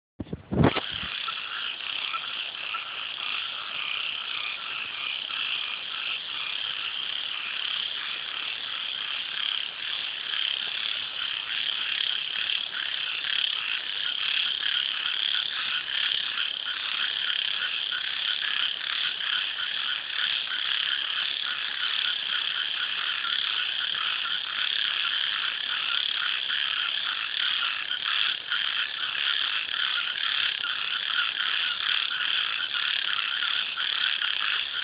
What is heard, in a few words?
creaky frogs concert; Moganshan